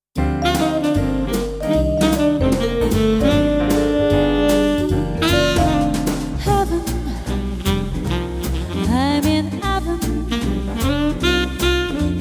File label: trio